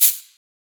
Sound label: Shaker